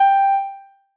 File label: noteblock g2; mesecons